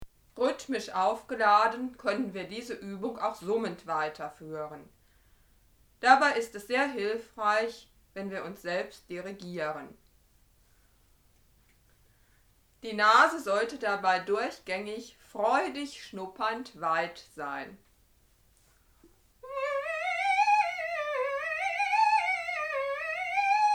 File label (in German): Summen